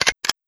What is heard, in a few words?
FX Misc